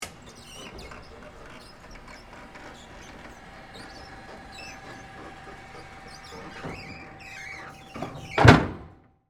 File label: Industry, Hi, Lo, Lift, Down; Machinery; Machines